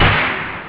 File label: drums snare; OctaMED SoundStudio V1